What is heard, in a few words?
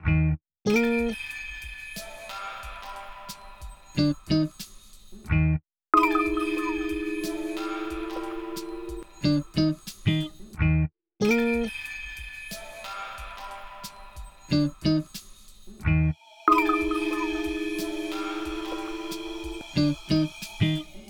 Melody